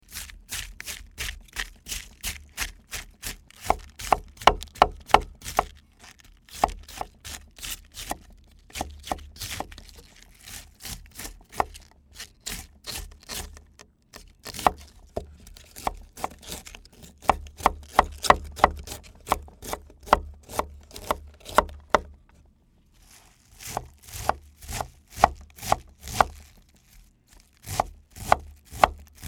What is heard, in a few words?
cutting cabbage